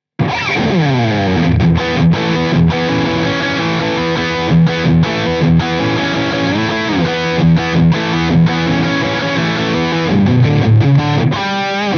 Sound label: Jensen BB40 HIGAIN HB THD